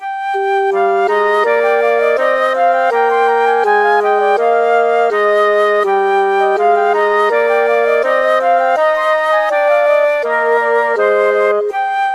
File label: Flutes; Patrick de Arteaga